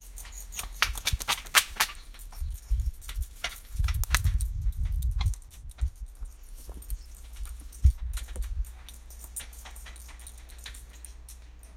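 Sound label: joints are cracking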